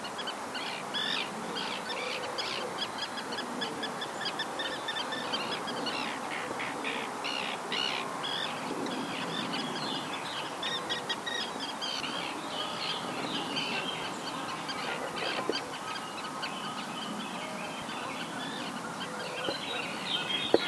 sterne pierregarin; haute ile

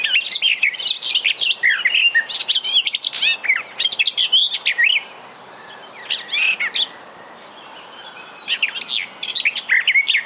fauvette des jardins

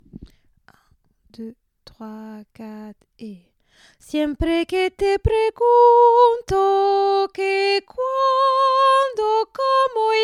soprano

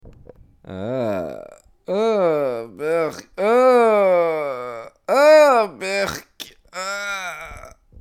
dégoût

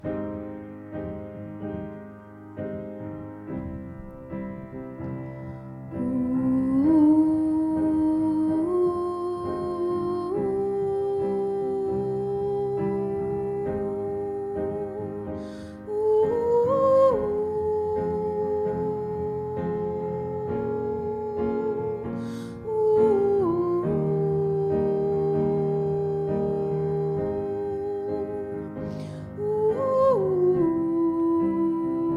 soprano ouhou

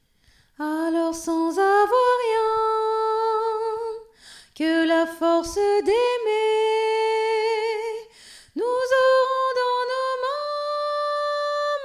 fin quand on a soprano

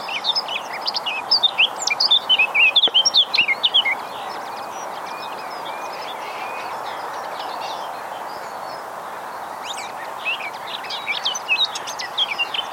linottes mélodieuses

